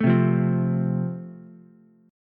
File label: F Major, Ukulele; strummed; chord